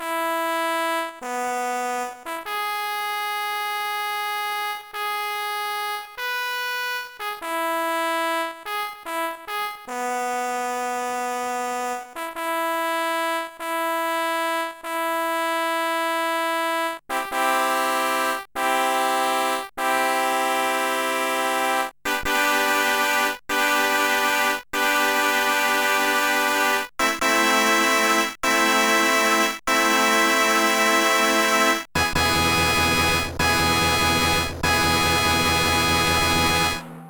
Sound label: fanfare; Paul Robotham; Dawn Patrol